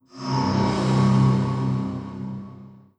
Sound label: wet, strider, combat, movement grunt; Half Life Alyx